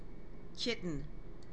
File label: kitten; animals